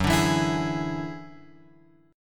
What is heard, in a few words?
F sharp 7th Suspended 2nd Sharp 5th F sharp; F#7sus2#5 Chord {2 5 2 X 5 4} strummed